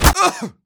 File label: pain, player; Team Fortress 2